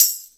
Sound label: Shaker; Vengeance Samples; VEH1 Percussion